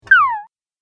Arcade Game Furry Bleep; Video Game Sound Effects; Retro Inspired Sound Effects - Think Nintendo, Atari, C64

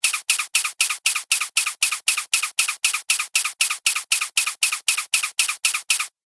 Cartoon Repetitive Pump; Cartoon Sound Design Sound Effects

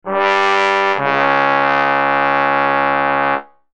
Logo Trombone Descend; Sonic Logo Sound Effects; Production Element Imaging Element Accent Transition

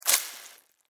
Insect Spider Bite Suck; Animals:Insects; Spiders

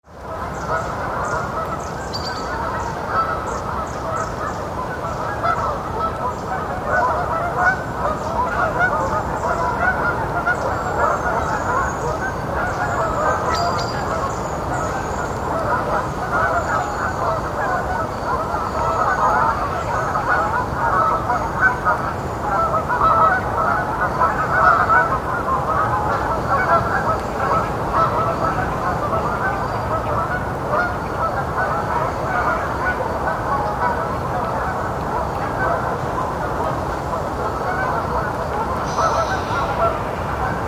Distant Canada geese across a lake.; Birds

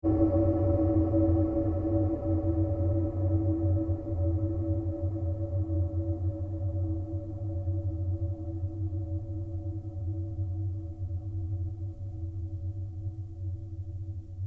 Created pulse fade long; sound building blocks created through manipulation of raw material; Rise And Dive

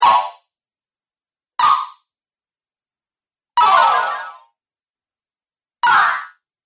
Ice Shard Spells Collection, magic

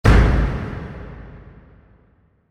Metal Door: Large Slam Shut, Reverberant; Metal Door Sound Effects; Metal Doors (Sound Effects:Doors); Giant door slam; Stuart Duffield

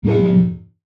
Whoosh Short Synth, Low; Whooshes; BlastWave

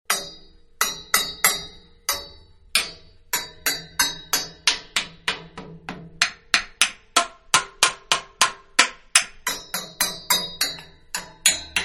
Hammer Small On Metal Pipe, Several; Hammers (Sound Effects:Tools); Manhattan Production Music

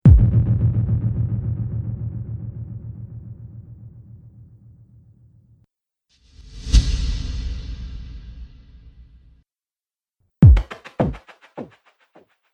Cinematic Drum Hits; Hit Sound Effects; sound design

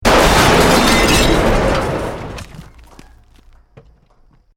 Building Collapse Medium

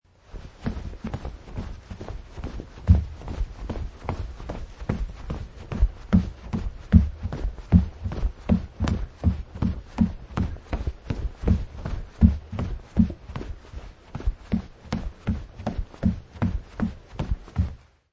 Jogging

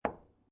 Billiard Pool Snooker Put Ball On Table; Sports

